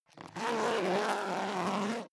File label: zipper down jacket open; objekte; reissverschluss